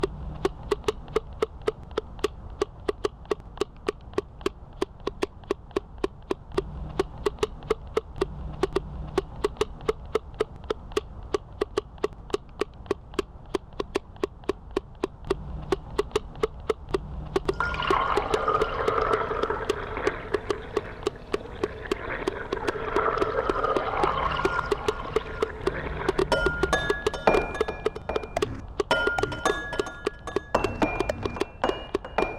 percussion; ved skuret

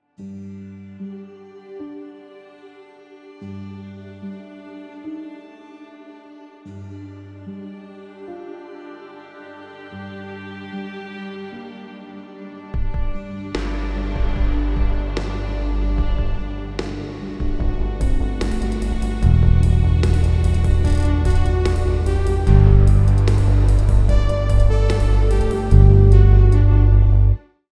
orchestra violation error

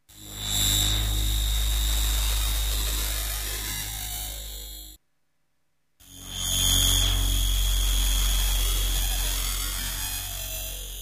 noise

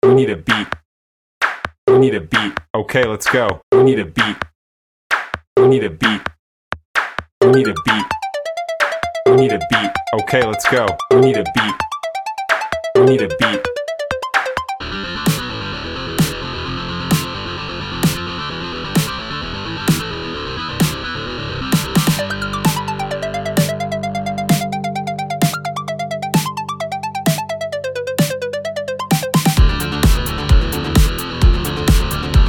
beat

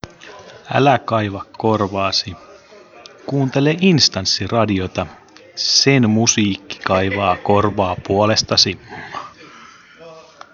jingle

